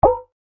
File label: Navigate: Short SFX For Navigating Between Options; Buttons; Multimedia; Stuart Duffield